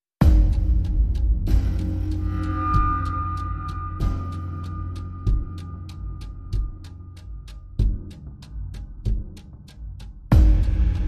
Less Strings

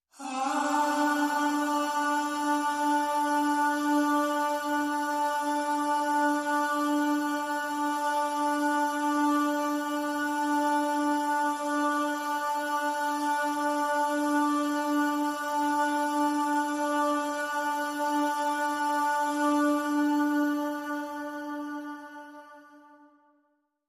Pop Vocal D, No Scoop

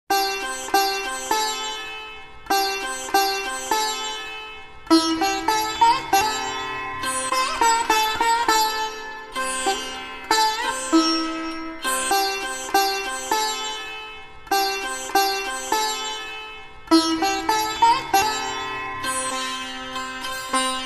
Indian Sitar

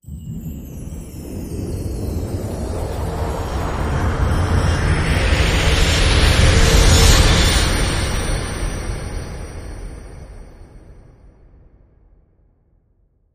Genie Sparkle Rise

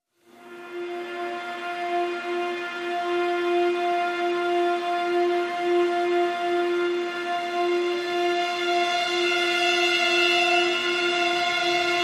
Pads Uneasy String (F)

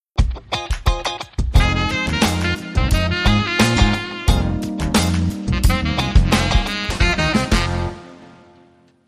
Sax Sting

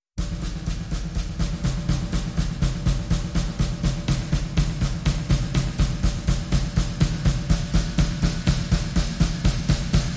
Drums and Bass Mix

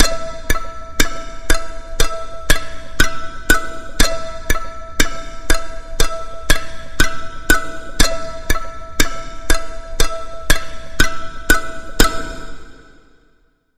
Pickle Plucky Mandolin Pulse

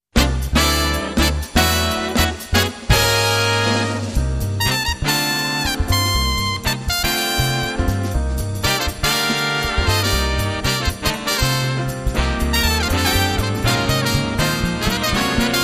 Jazz Brass

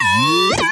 Droid

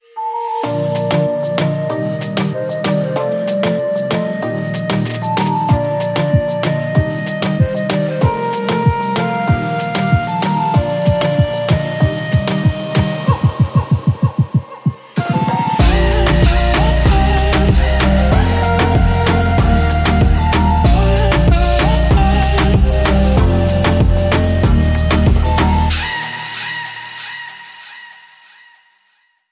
Caribean Flute

